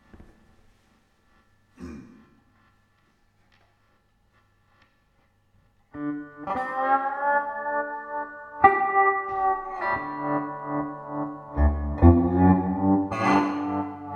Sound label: trem; effectrode